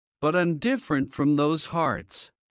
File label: Heart low; Classroom humanbody